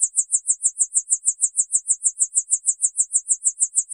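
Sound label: Chirp DX100 D#5; birds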